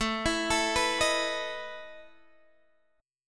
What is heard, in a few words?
E Major 7th Suspended 4th, A